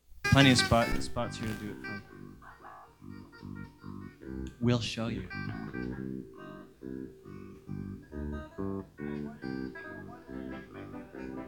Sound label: Band Warm Up (Soundcheck)